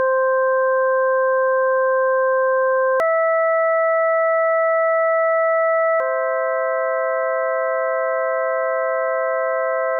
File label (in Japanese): ド-ミ(純正律複合音); 実際に平均律と純正律の音の違いを体験してみましょう。; classical music